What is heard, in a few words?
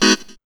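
house chord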